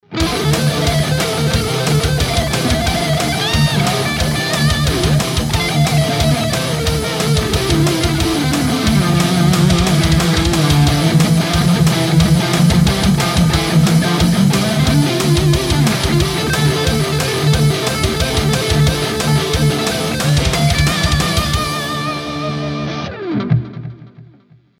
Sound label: digitech hothead